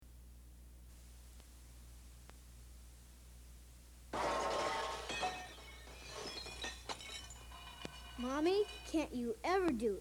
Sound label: noise